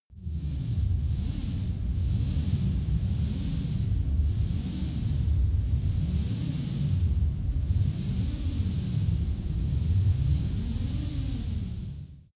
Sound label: dark machine room